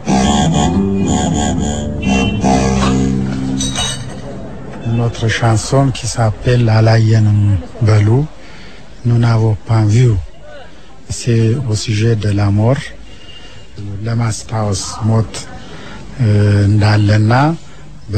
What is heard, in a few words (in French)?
Harpe